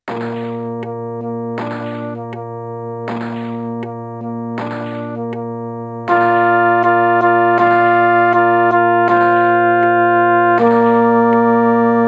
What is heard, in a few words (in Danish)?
midi